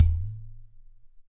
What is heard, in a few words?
Udu Booyoo